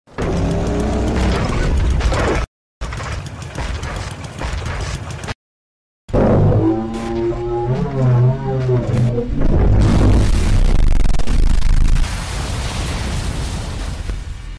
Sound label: robotic sounds